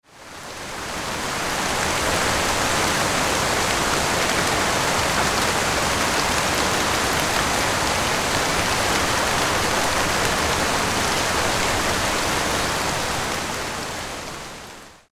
slate noise heavy rain on stone

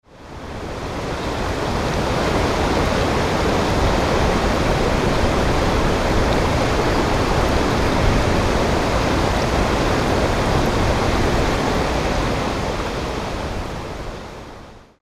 Green Noise

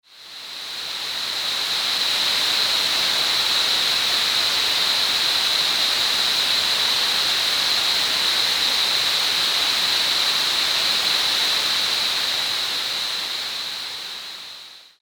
4000 Hz Noise Masking; Tinnitus; Tinnitus Softener

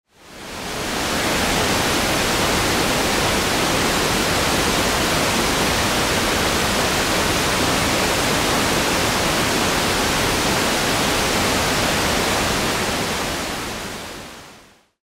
pink noise cassette player

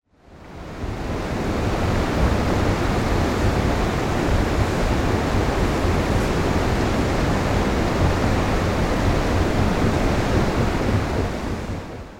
noise color mashup

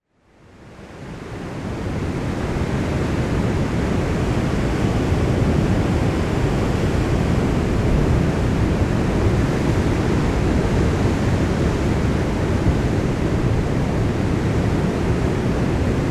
bilateral ocean sounds

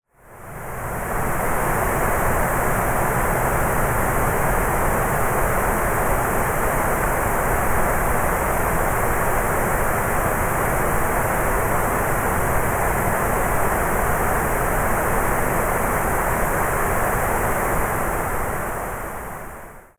redwood forest 4200hz notch noise masking

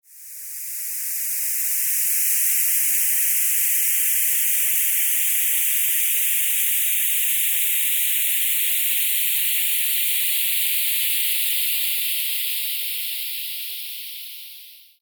Sweeping High Frequency Noise; dynamic frequency; sleep; tinnitus

